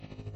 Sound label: buzz